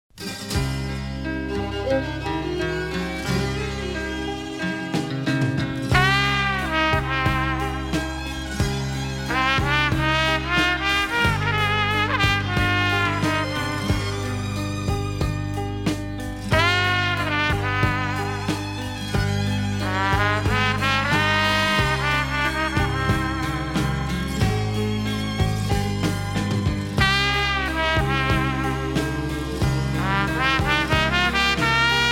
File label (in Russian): Мелодии трубы